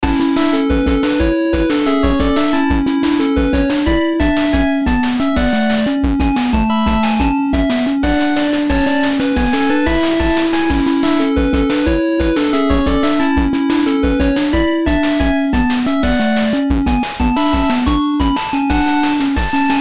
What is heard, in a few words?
8bit